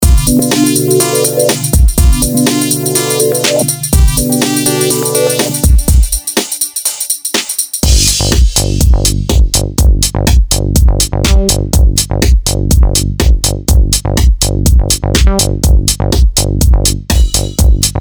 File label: beat; bpm:123